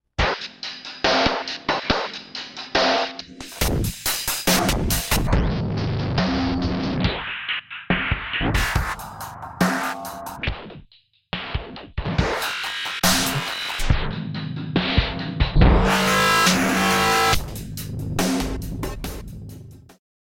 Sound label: drums; ohmicide